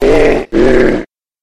monster toxic death

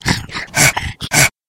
monster salamander

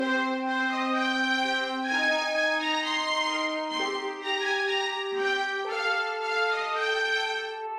holiday thanksgiving, music

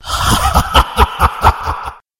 monster stone giant

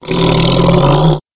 monster ettin

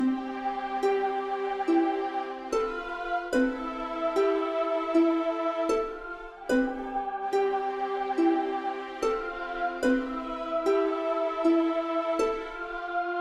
holiday valentines

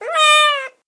npc cat

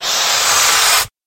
monster blowfish

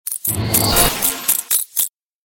monster spider mage

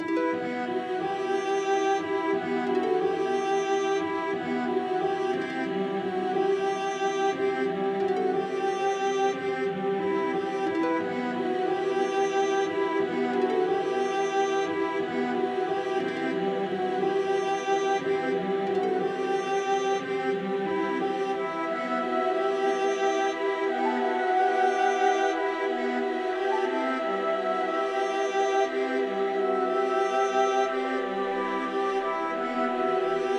wilderness calm forest

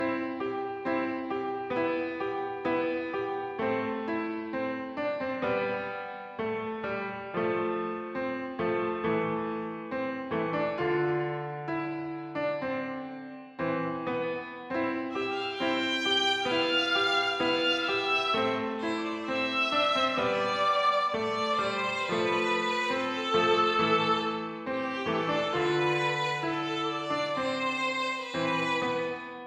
misc church, music

